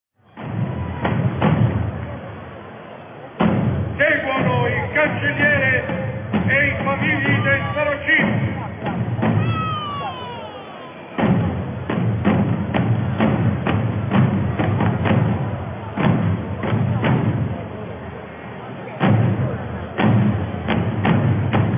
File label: Drums Anthem; Italia